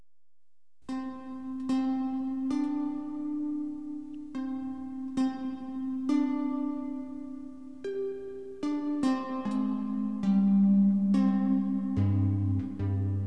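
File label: fuji flute; SoftKey Entertainment Pack; SoftKey Multimedia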